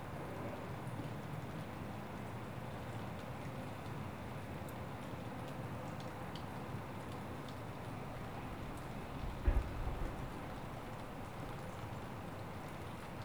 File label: ambient outside